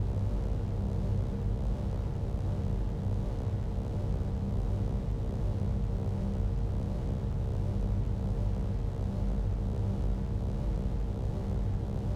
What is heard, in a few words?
Barrier Loop; TANK; 3Souls